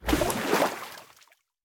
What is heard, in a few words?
underwater exit; Minecraft; Votive World